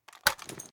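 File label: foley assault rifle akilo47 grenade launcher reload end; weapons; viper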